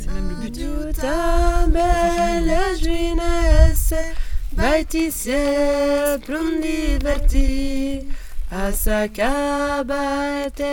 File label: Addio Paure Carnavas ALTO